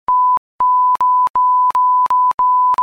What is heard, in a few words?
pipip aaaaaaaaa